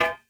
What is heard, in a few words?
drop metal bars; Rust